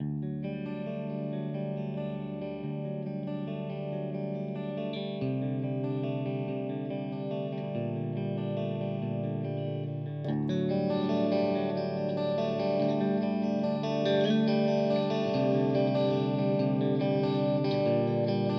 Verzerrer Pedale Transparant Ov Morning Glory

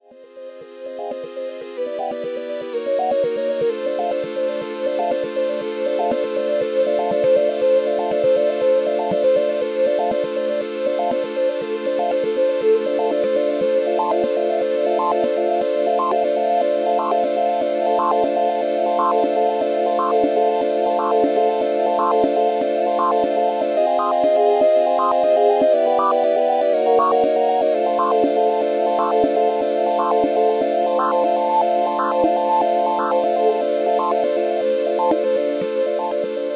Rossum Electro Music Morpheus Tension Wire Resonanz Play

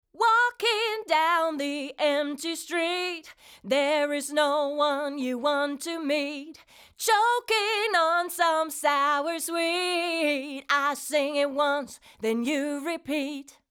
UK Sound 276 Vocal 4 zu 1 Attack 10 Uhr Release 2 max. Reduktion ca. 7 dB

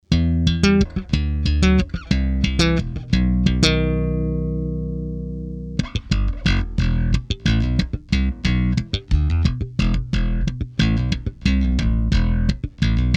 Badewannen EQ; Taurus

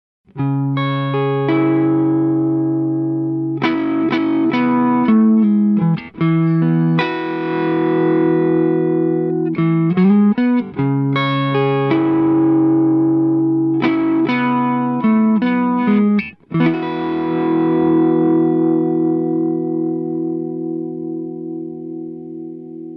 Korg NuTekt TR-S Low Gain P-90 wenig SAG

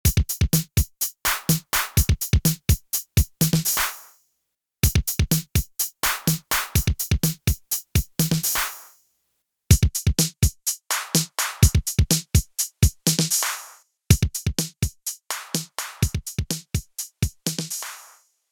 hybrid loop roh; ssl uc1

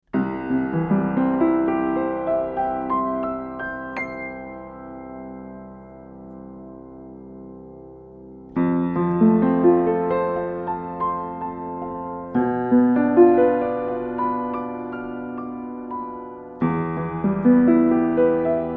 DPA 4060 Piano Kugel Metallrahmen Oben

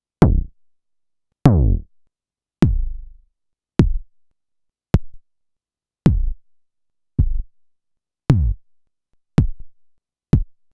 Einzelsounds BD; Soma Pulsar 23

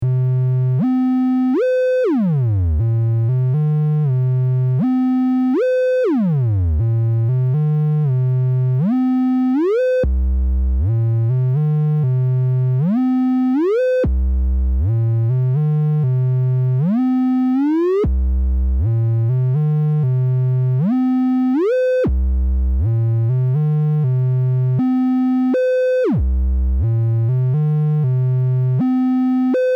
Slew Test verschiedene Rise Fall Zeiten

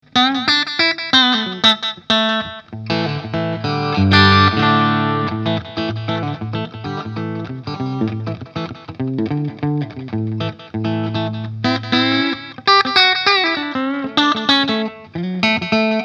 Danelectro 59X steg coilsplit clean

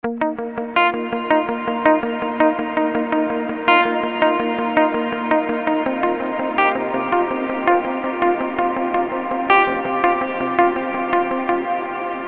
Gitarre

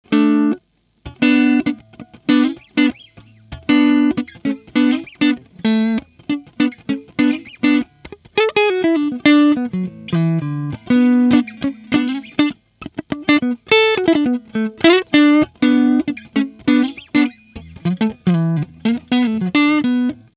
Harley Benton TE 70 Hals clean

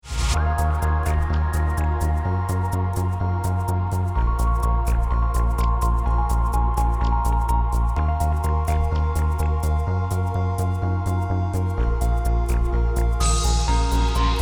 mit Spread

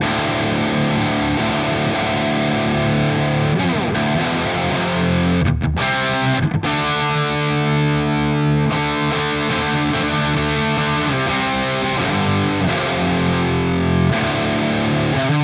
Signature Strat Referenz Gain; Sterling By Music Man Richardson

